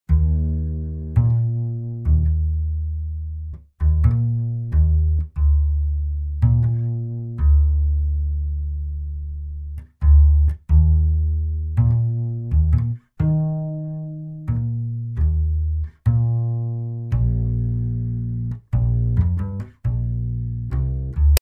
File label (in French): contrebasse